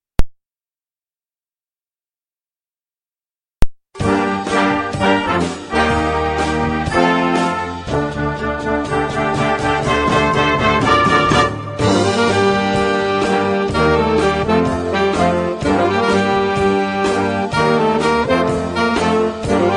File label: saxes